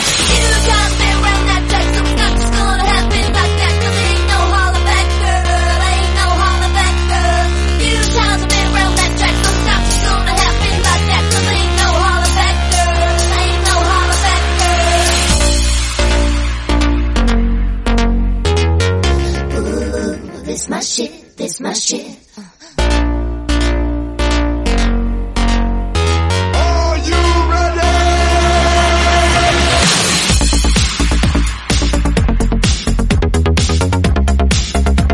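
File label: 128 bpm B flat minor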